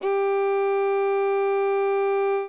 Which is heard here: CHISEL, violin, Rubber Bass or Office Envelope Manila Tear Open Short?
violin